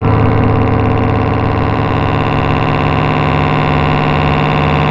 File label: Ground, Industrial